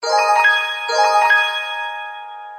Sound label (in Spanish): TONO DE AVISO DE EVENTO O MENSAJE ORIGINAL SAMSUNG A8; Samsung A8 2019